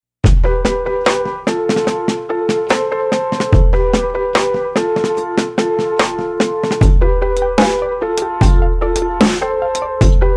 Lofi Happy

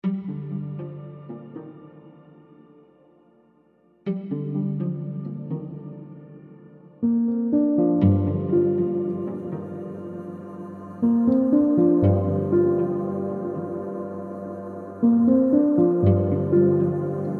nyckelharp

